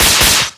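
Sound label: Battle Skill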